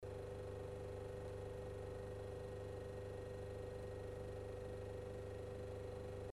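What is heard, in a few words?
sound of refrigerator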